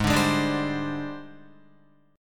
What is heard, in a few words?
Ab Major 7th Flat 5th Ab, down, Guitar; strummed; JGuitar